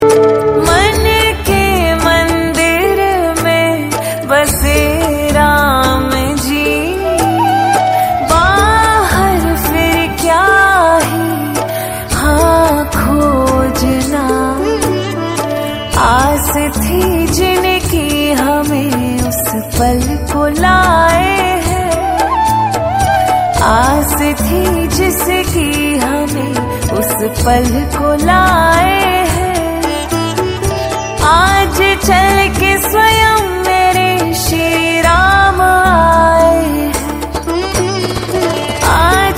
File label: Bhajan